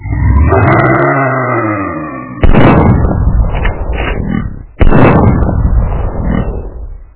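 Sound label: monster noisy mass with shots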